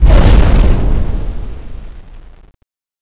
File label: uav shoot